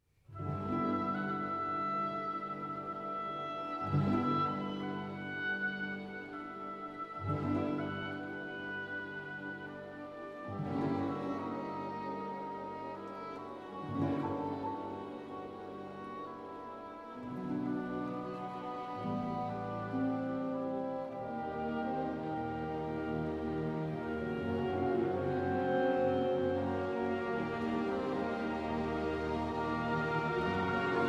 Fantaisie for Piano and Orchestra, Andante - Allegro; Debussy; CD 72, L. 73 I.